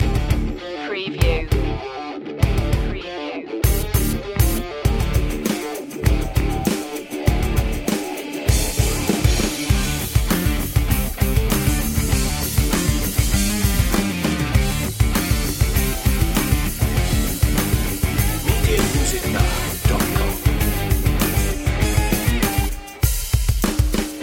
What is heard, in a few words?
hard like a rock loop